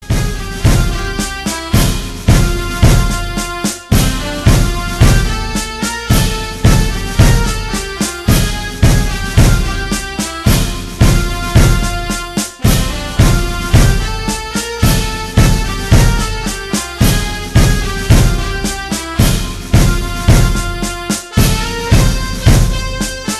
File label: Sare Jahan Se Accha instrumentals ringtone